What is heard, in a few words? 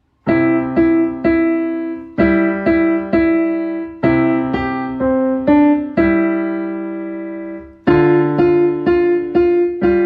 Jingle Bells